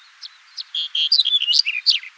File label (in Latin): Emberiza melanocephala